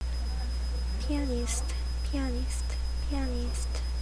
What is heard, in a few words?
pianist